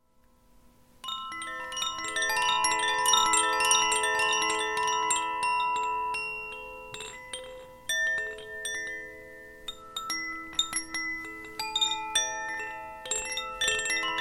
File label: ring, notifications; libervia